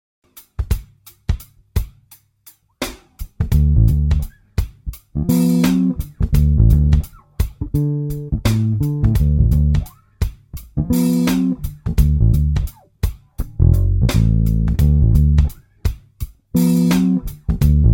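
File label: Funky oldschool D7, bass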